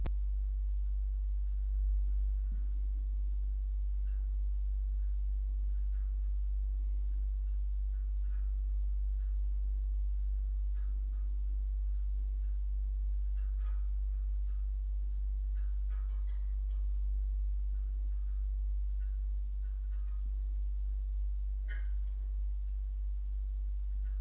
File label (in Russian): promyshlennyy gul